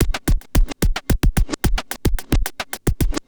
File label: Industrial Beats